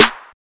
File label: wet acoustic snare sample g sharp key, snare sound tuned to the G# note